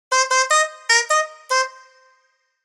Sitcom accent trumpet jazz

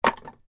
Box of wooden puzzle pieces put on the table

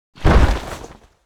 Dragon landing ground impact

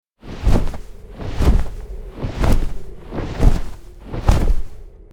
Dragon flight air whoosh loop

